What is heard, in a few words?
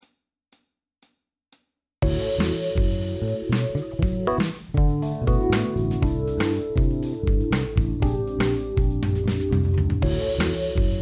groove